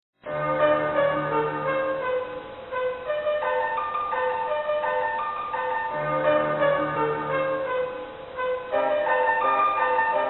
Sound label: horror remix; plants vs zombies